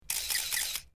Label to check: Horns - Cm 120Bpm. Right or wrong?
Wrong